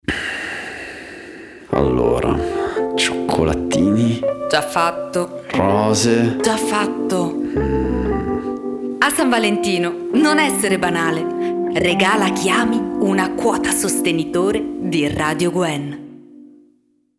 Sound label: Jingle; Radio del cuore